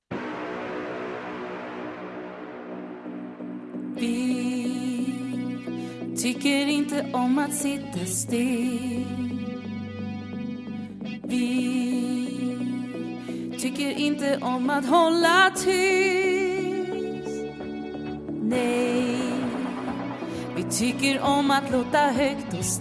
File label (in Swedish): En häftig kör